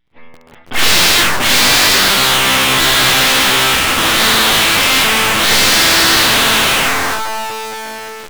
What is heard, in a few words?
Snare Gate; Moogerfooger MF-107S; Blast